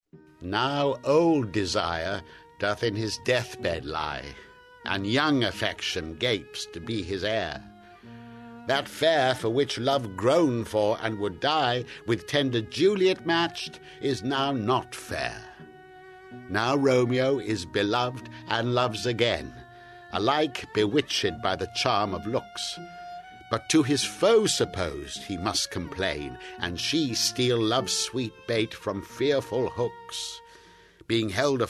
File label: Chorus; Romeo & Juliet